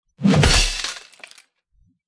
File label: crash